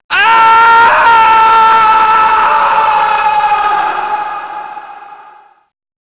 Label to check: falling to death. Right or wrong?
Right